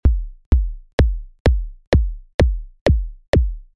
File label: kick; att time